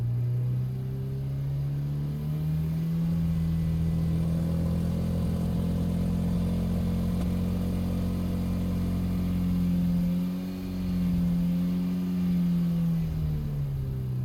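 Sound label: engine high loop; Blind Drive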